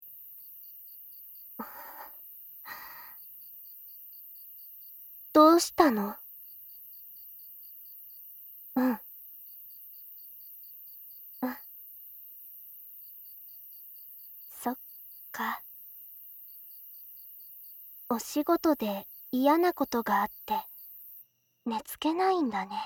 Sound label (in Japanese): 音楽と安眠と バイノーラル編集, ASMR; お姉ちゃんと音楽と安眠と